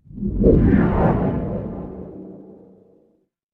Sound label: magic scifi teleport